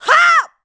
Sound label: Power Attack; Link power-attacking (sword-spin, jump-slash, etc.); Zelda